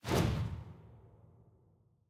ui whoosh next

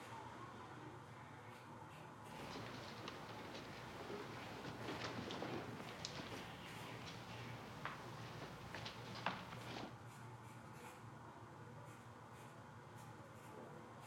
amb

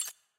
mute button click; premade voice

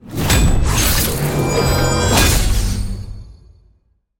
ui ranked legend; jade

